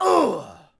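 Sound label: Axeman Hit Vox; Civ4 beyond sword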